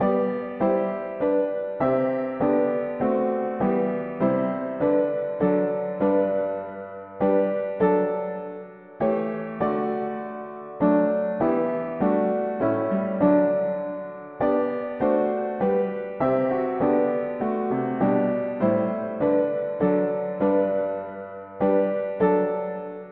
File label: mono piano; hymns